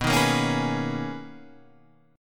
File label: B Major 13th B down